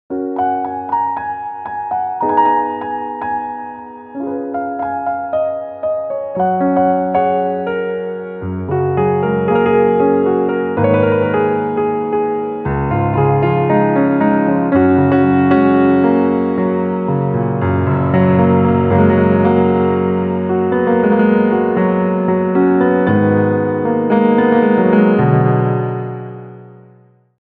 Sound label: Piano